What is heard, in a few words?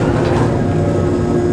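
conveyor loop; Tony Hawk's Project 8; XBOX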